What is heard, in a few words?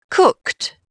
COOKED; Dorling Kindersley My First Incredible Amazing Dictionary